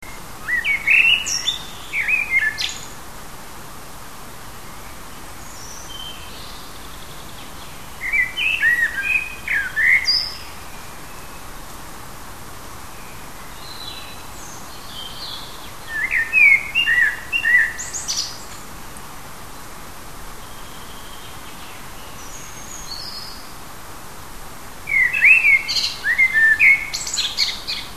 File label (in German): vogel heimisch kurz